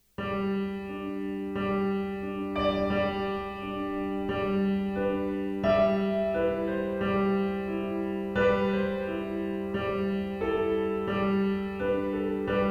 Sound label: piano pop